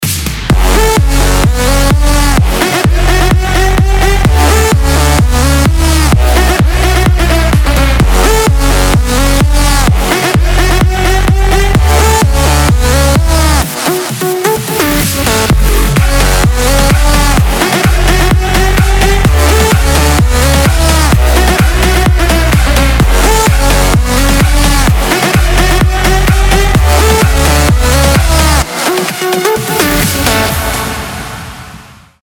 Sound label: ultra bass